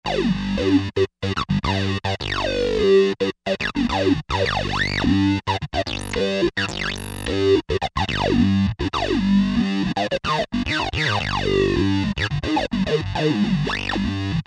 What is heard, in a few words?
TB Dist Bs, Roland SRX-05 Supreme Dance